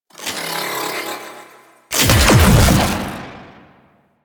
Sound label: Draw And Fire; Drifter Lotus End; Omicrus